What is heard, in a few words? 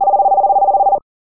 ring